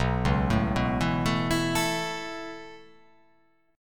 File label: B 7th Suspended 2nd Suspended 4th down, chord